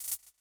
hat

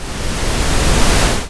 se noise; 东方Project; th16

